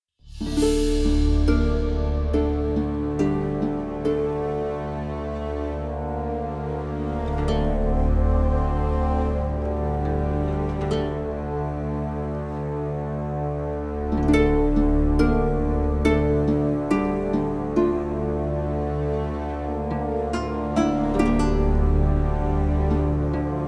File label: Mood Music Drama; Skellige; Mood Music Drama 02 01